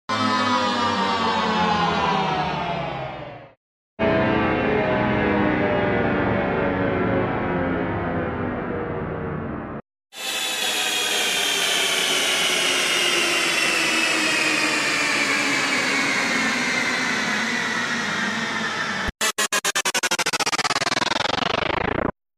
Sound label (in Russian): 4 vida zvukovih effektov istosheniya energii scifi